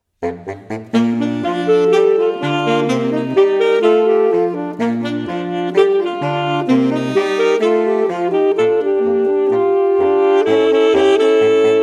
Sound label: Sax Quartet